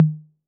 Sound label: Tom Mid A 808, Clean Digital